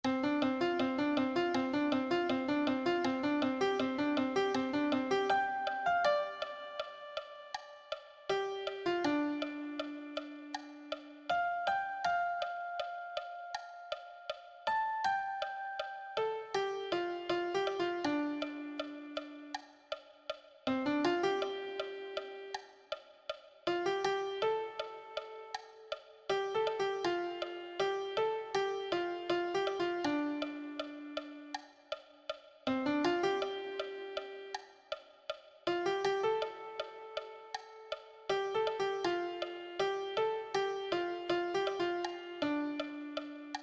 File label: lead, piano